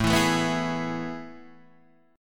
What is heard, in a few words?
A Major 7th Suspended 2nd; AM7sus2 Chord {5 7 7 4 5 4} strummed